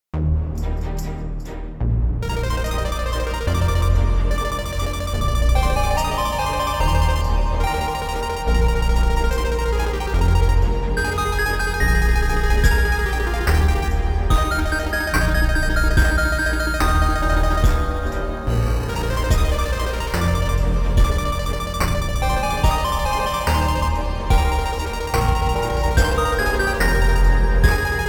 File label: chiptune; Tchaikovsky